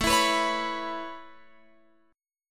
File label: A Major Add 9th A Guitar Open F, chord; Aadd9 strummed